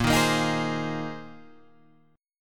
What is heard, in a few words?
A sharp 9th Suspended 4th A sharp Guitar Half Step Down